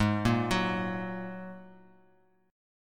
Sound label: G sharp 7th Suspended 2nd G sharp; G#7sus2; arpeggiated; x,11,8,11; JGuitar